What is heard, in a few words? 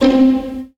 Gritty String Pluck C1